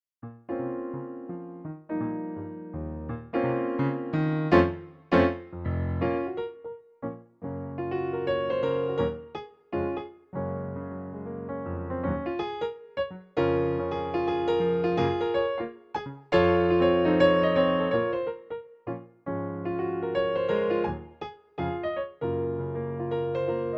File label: Piano Solo